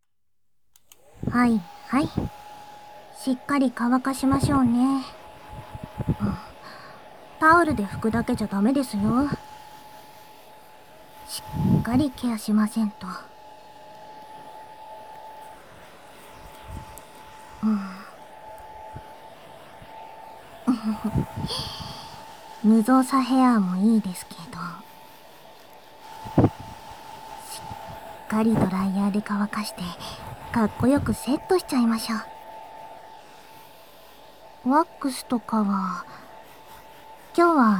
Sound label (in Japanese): 耳掃除; 小林さんが耳掃除をしてあげましょう。